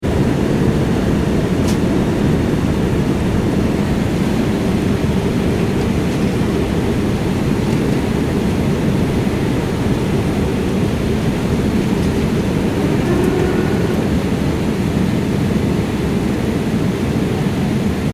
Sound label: transportation aircraft commercial airbus a340 interior approach, In-Flight Final Approach Ambience; Airplanes; Vehicles